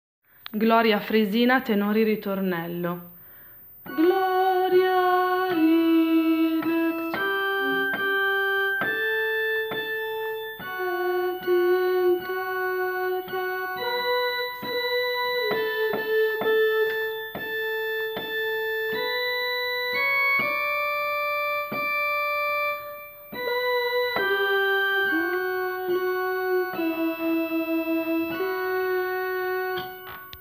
ritornello tenori

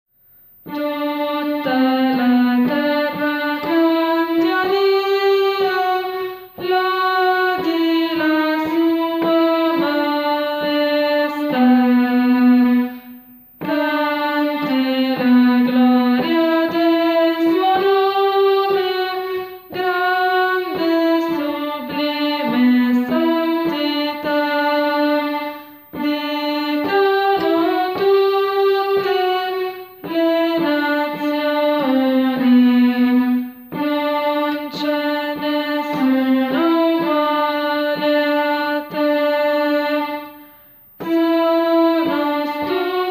Tutta la terra canti a Dio contralti

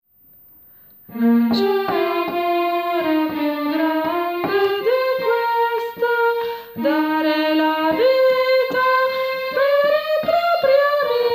Non c è Amore più grande contralti

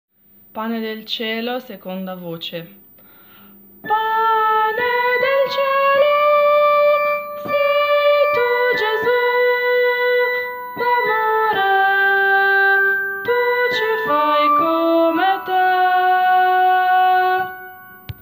Pane del Cielo 2a voce

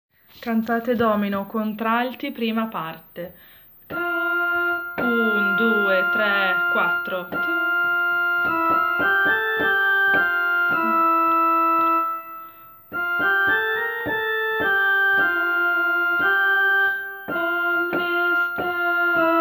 contralti; Cantate Domino

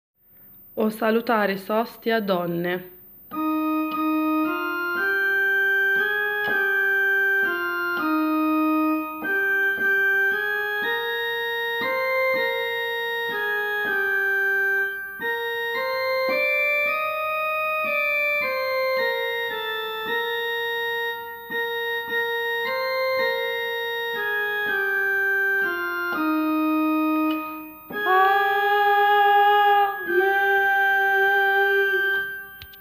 donne; O salutaris hostia

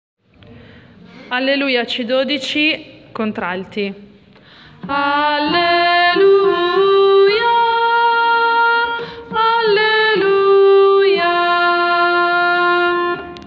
Alleluia contralti

